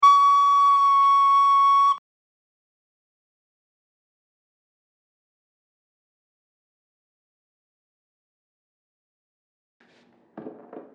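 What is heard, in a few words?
shuffle chafe instrumental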